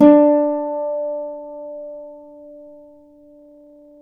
Celtic Harp, Plucked Strings

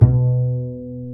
Bass, Roland

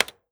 KEY, SWITCHES; AKAI Anime Sound FX

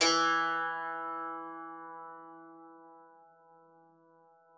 KCYM E3, KLAVICYMB; Xsample VOL-8

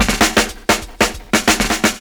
FILLS, 70_s Breakbeats

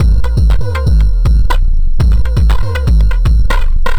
bboybeat 120bpm, downbeat dance grooves

